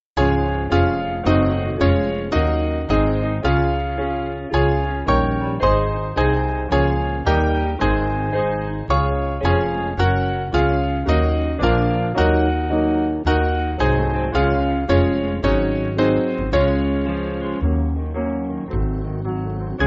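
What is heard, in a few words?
Piano, Music Backing Files for Churches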